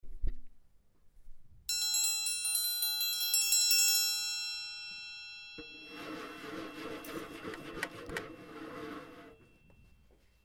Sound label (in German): Eisenbahnmusik